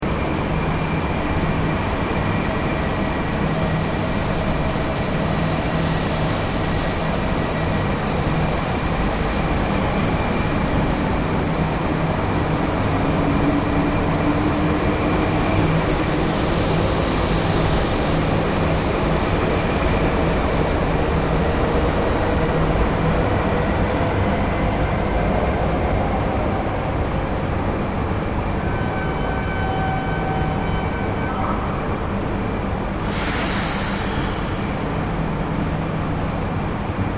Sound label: AMBIENCE TOKYO SHINKANSEN PLATFORM, Tokyo train station for bullet trains; Train Stations